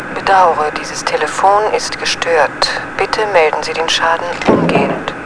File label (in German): telefon